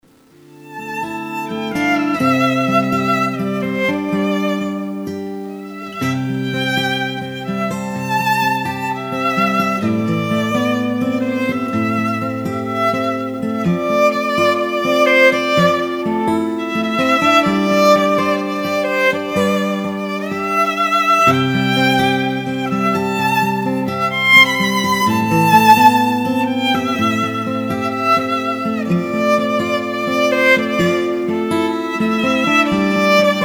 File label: violin guitar